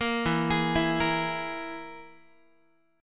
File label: E Major Flat 5th, Guitar; arpeggiated; JGuitar